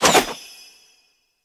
Fire; smite; raijin

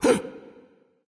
nv breath, vox; smite; voicepack; vulcan

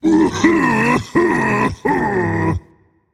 boohoo rage; chaac

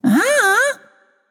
confused, voicepack; smite; jorm

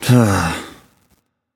nv sigh; smite; voicepack; mercury

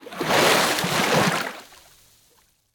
splash emerge; smite; poseidon

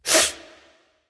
breath; Smite; bacchus

